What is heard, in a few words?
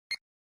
beep